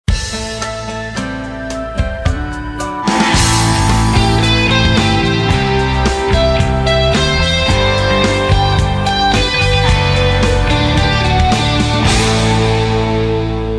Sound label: 8 bit